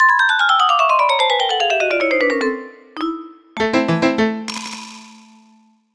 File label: Haunted Lose